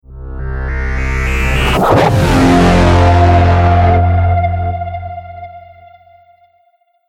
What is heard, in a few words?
Trailer Hit Glitch; Trailer Sound Effects